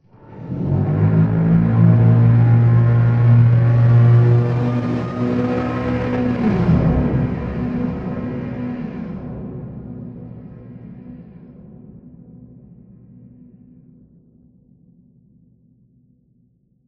cables pulse; Half Life Alyx; music; vault

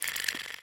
slobber, clams; infestation

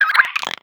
idle chirp, headcrab, creatures

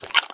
breath out, headcrab

